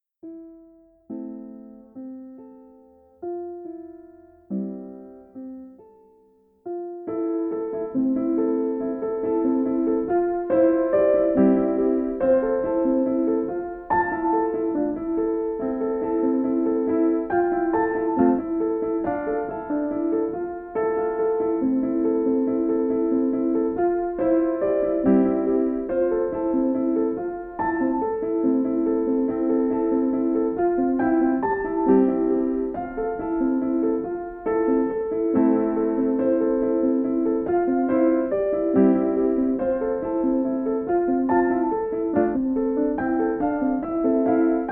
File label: Easy Listening Piano, Relaxing Piano Music, Calm Music for Studying